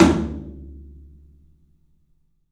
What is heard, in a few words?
3-D Percussives, LOW Toms; E-MU Producer Series Vol. 5 – 3-D Audio Collection